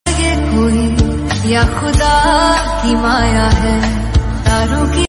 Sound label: ringtone